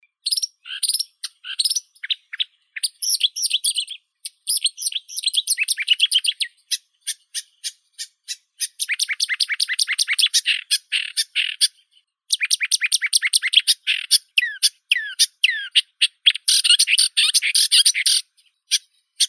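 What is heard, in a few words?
marsh warbler, Acrocephalus palustris; kamishovka bolotnaya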